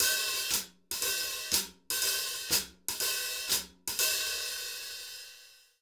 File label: 120 HH2, Jazzistic; Univers Sons